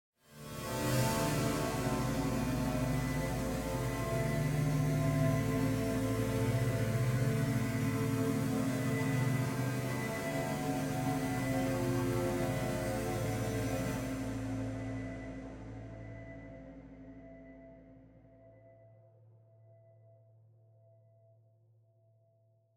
City Reprise Music, Call and Response Intro Chord

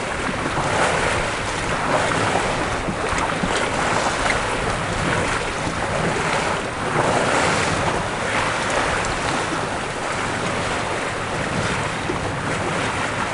Water Lap

